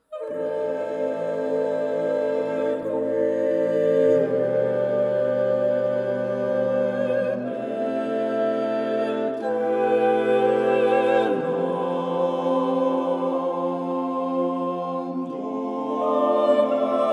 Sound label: Angelic Requiem 1st Soprano Soloist